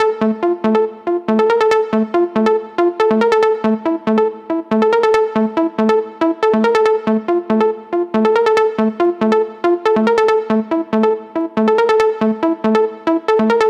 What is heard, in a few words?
NRG Lead, trance